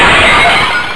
glass break

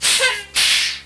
airbrake, aircraft

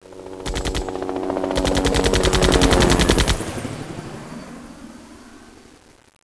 helicopter shot; aircraft

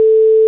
short beep tone; computers

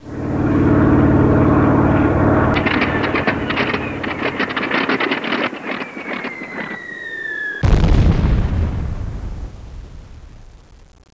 prop splutter, aircraft

